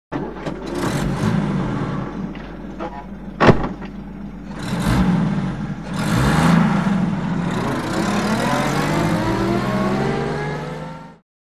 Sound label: Automobile; Start / Away; Austin Truck Start Up, Grate Into Gear And Away.